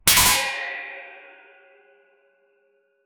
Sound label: ricochet shot shotgun